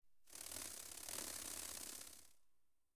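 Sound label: ambience elves; wingsofriches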